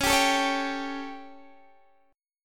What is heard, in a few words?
G Suspended 2nd Flat 5th C sharp, chord